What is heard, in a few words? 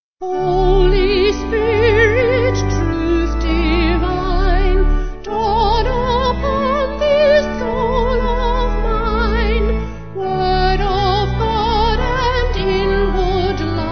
Vocal Organ